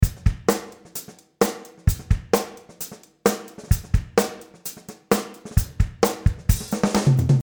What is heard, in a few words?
Drum Real Percussion